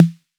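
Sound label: 808 snare drum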